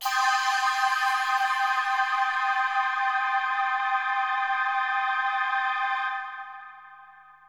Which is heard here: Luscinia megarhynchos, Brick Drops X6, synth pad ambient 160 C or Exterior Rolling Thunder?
synth pad ambient 160 C